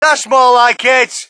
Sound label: crowd, shout; Mike Tyson Heavyweight Boxing